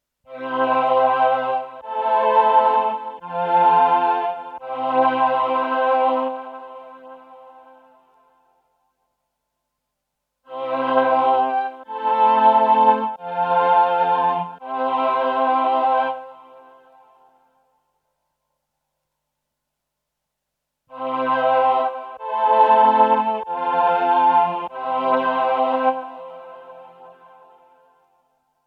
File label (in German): VP330 VC340 Chor Attack Release